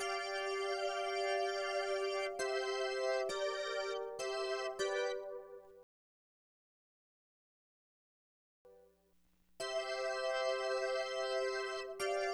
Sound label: Organ, Hip Hop, 100, G